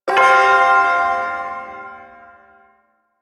Shawzin Pent Min Chord; G; Warframe; Duviri Ersatz Shawzin

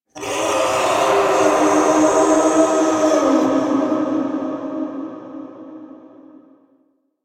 Howl; Warframe; Wolf Of Saturn Six; Episode One

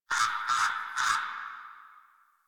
Tree Critters Scared, Ambience; Props; Warframe; Duviri

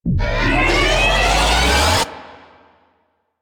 Mega Laser Charge Up; Warframe; Teralyst; Ropalolyst; Abilities

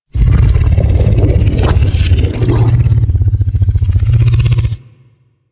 Vocal Idle, critter; Warframe; Hunting Critters; Undazoa

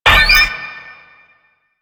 Activate Switch; Warframe; The New War; Narmer Outpost

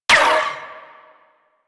Uzi Layer; Warframe; Tenno

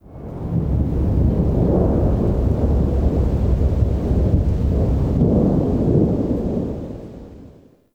Exterior Rolling Thunder; Warframe; Ambience; Duviri

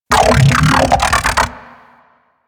Ambient Vocal; Nechro Mech

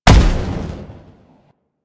Rifle Spaceman Explosion; Warframe; Venus; Venus Vip Rifle Spaceman Agent

